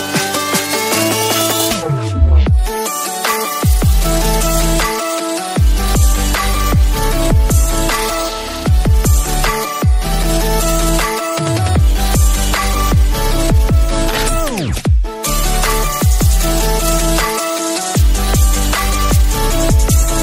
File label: Redmi Note 9 Remix, tono de llamada